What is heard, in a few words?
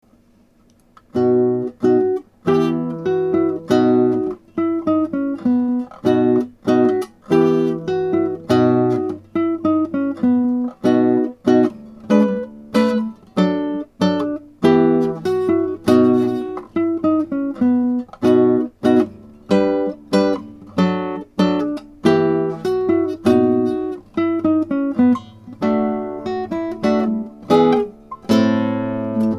danza lute